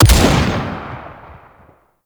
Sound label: dropship turret shot; Grineer; Warframe; Tau